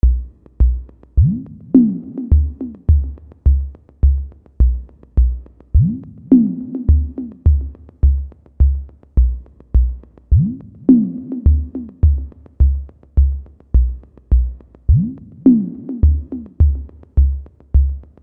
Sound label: Pro One Self Oscillating Kick